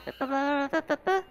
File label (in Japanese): ファンファーレ; ドンキーコング６４